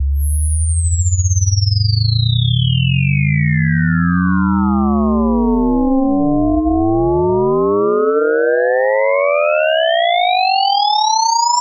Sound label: Riddim Riser, Ringaling; One Shots; Ghosthack; Riddim Essentials 2020